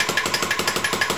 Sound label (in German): pressluft